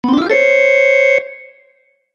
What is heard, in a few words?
Lose Sting